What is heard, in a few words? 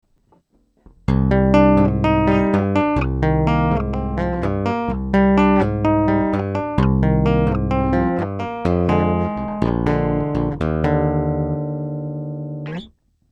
Harley Benton BZ 5000 2 Hand Tap active